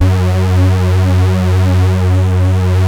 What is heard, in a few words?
JUN 106, BASSES